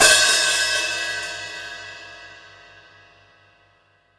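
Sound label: hi-hat open, Drums Acoustic; USB Soundscan vol.10; AKAI; 90s sample CD; DYNAKIT 3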